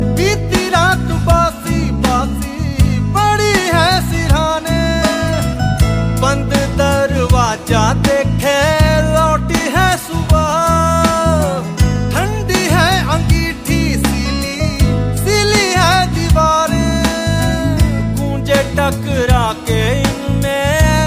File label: Male